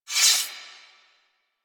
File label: Machete Finisher Equip; Warframe; Quests; The New War; Kahl; Weapons